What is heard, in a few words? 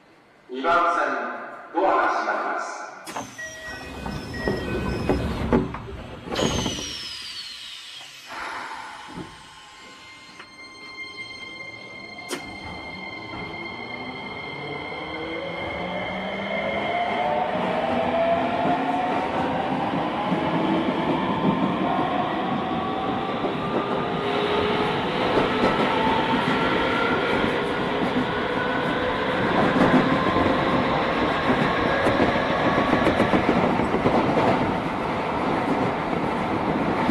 keisei 3000; vvvf; toyo denki